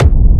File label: Techno Kick; 30 Techno Kicks 2025; Ghosthack